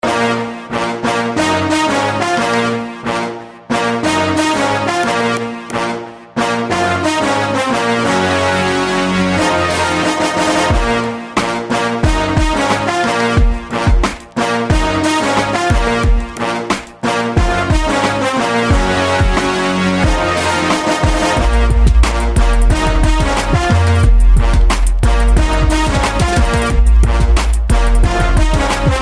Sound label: Epic Brass Tone